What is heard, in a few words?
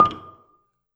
Gambang Soft Beater, Gamelan